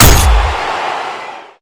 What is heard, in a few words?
parry; tfdb